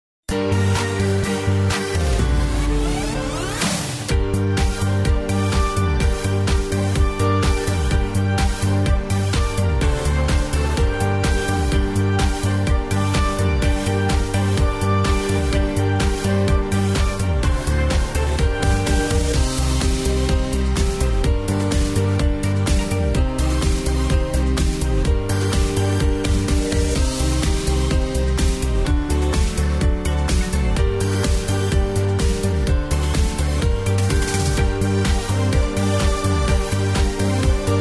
haunted holiday rhythm track, square dance music

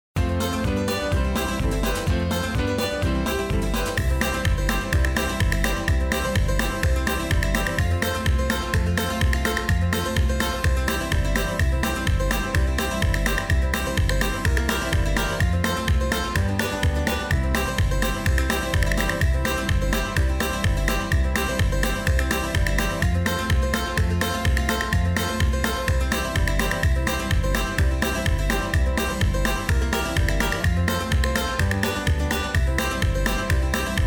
patter sticks rhythem, square dance music

